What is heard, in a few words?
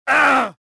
choke; rebel